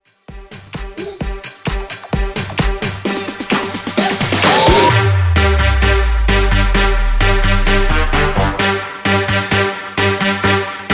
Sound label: Mashup